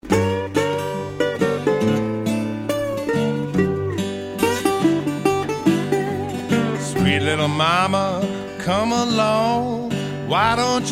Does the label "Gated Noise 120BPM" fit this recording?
No